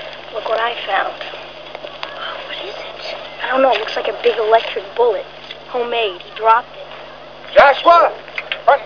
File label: bullet; Critters; Josh: Look what I found / Annie: What is it? Josh: I don't know. Looks like a big electric bullet homemade. He dropped it.